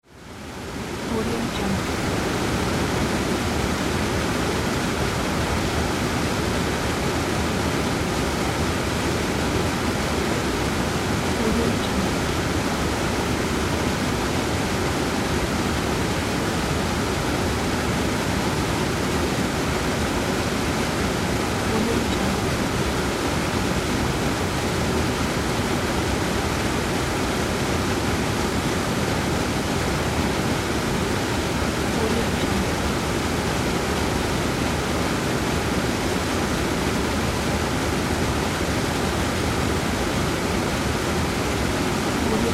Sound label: waterfall atmosphere